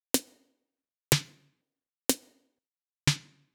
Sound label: Big EDM Clap Loop, 123 BPM; Future House Tools; Big EDM Drum Loops